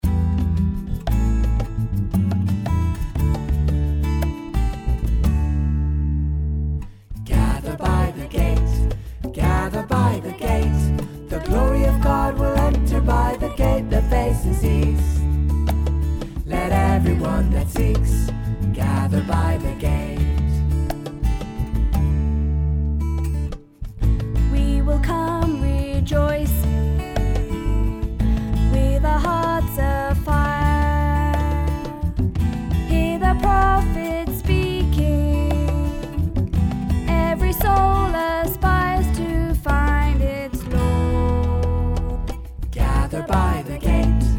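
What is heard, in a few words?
Song, English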